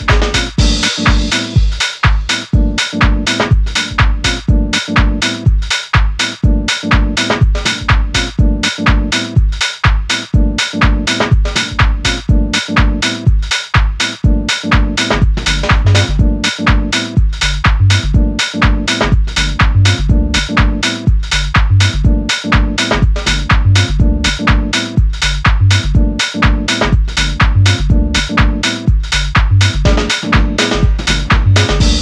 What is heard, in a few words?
Dance DUB